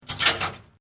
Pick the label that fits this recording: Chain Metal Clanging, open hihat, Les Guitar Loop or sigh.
Chain Metal Clanging